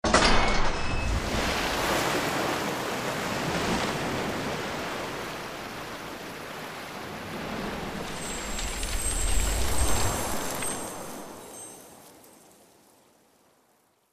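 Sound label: Event Liquid Water Vinepit Stream Fill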